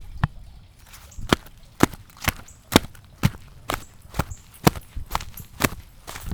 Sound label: footsteps